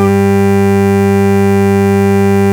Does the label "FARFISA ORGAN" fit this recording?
Yes